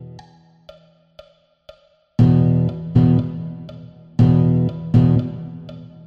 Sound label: Rhythm example, blues